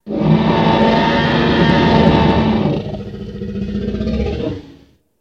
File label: Dinosaur Roar Longer